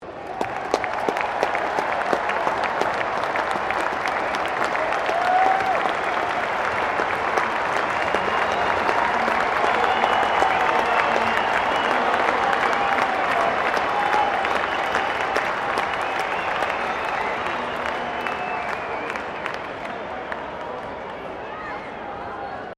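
crowd 20K Melbourne Cricket Ground cheer low, Cricket at Melbourne Cricket Ground; Crowds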